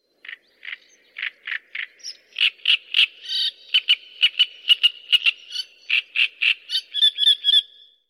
carricero tordal

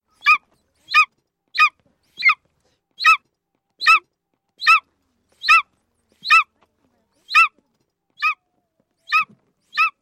aguila real

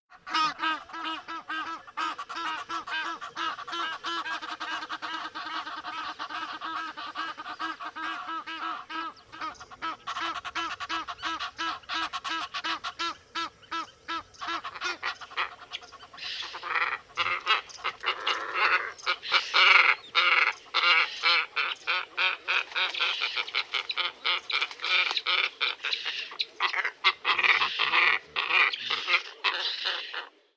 morito común Plegadis falcinellus

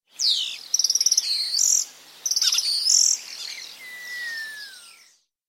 estornino negro

